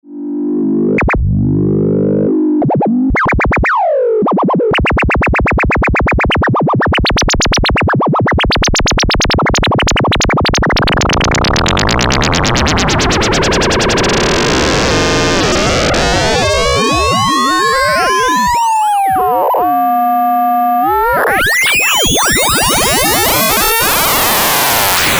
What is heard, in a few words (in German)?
Io Instruments Euporie Selbstoszillation Dual FM